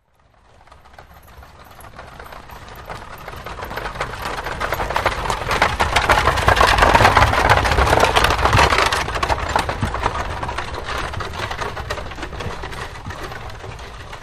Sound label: Carriage By Medium Sp, carriages